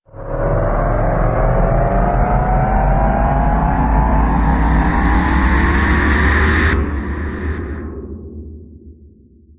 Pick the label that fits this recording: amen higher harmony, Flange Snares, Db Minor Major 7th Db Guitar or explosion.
explosion